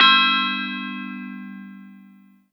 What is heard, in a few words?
Bell, One-Shots; Rondo Brothers Trip-Hop Loops & Samples; Until We All Fall Down